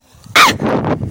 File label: jumpscare